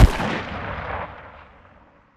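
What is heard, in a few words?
mid distance attack tail; Titanfall 2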